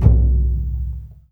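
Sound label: LOUD DRM, loud drum; Spectrasonics; Heart of Africa 1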